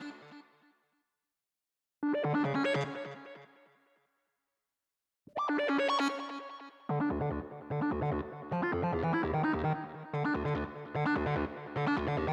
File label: Frantic Mix Stem Loop Layer; Atari